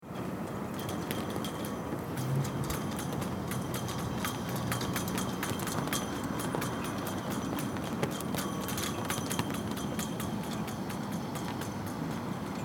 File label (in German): Landschaft Fahnenmast